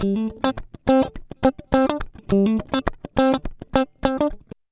104 FUNKY, Electric & Acoustic Guitar Loops; USB Soundscan vol.04; AKAI

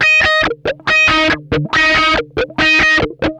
OCTICK, Funk Guitar; 90 s Sample CDs; Zero G

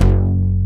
NOIZE BASS; Dance; BASS SOUNDS; Masterbits; Dance Trax

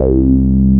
A BASS; CMP - Analogon

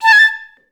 A5, Orchestra; Best Service; Peter Siedlaczek's Advanced

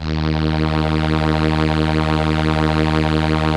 ARP STR ENSL, First Call Vintage Keyboards

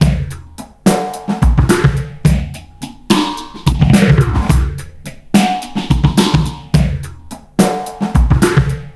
DRM, Breakbeat; FAZED ACT 107

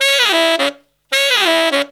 160 bpm, horns; Zero-G; Phantom Horns

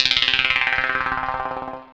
SYN FX; X-Static Goldmine 1

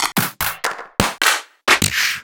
Claps

